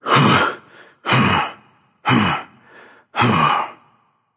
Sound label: nv breath, vox; smite; voicepack; vulcan